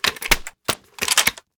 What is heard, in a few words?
Wpn silenced 22 smg jam